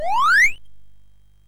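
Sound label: Nutcraka, MOS 6581 SID chip music, games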